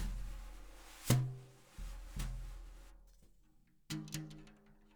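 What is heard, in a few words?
snare top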